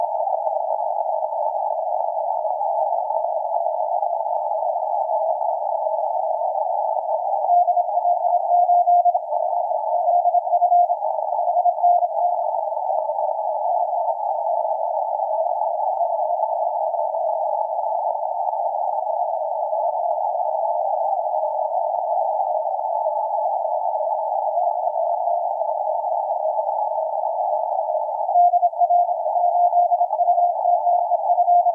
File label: 30m CW; CT9